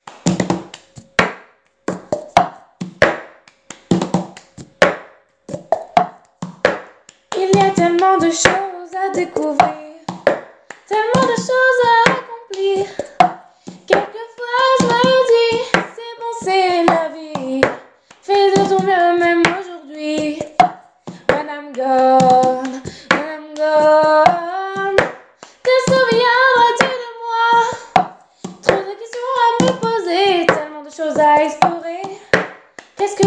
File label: Cup song